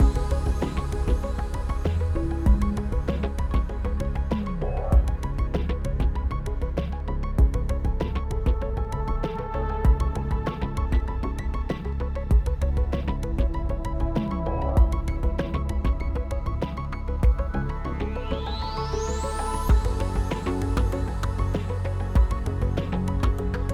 Puzzle 8CH 97 5BPM

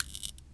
spark

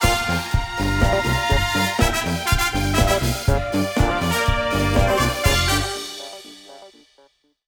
Music Disco; Legendary Hat

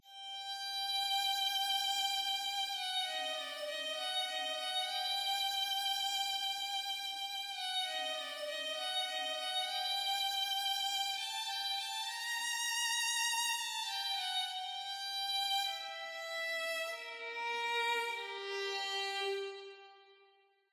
String; tintin reporter cigars of the pharaoh

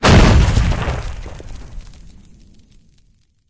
Missile Explosion; lethal company

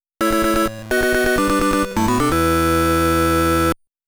Pattern Clear; Contra Chronicle Vol.2 The Beginning of the Legends; Triumphal Return 1

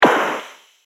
Arm Cut; Handshakes

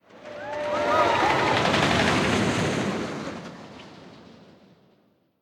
Boardwalk Rollercoaster; Diner Dash Hometown Hero

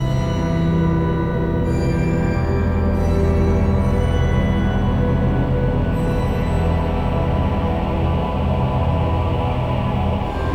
Level Cathedral; Dungeon Hunter; android game music

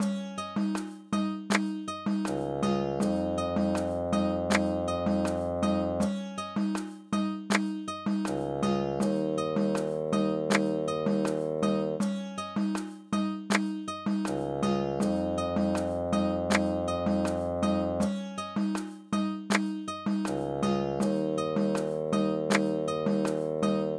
Level BGM

